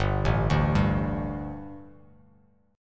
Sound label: G Suspended 2nd G, down; Gsus2 arpeggiated; JGuitar